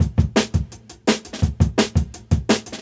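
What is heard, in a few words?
Jungle Breaks, 170 bpm; Militant; Original Jungle Breaks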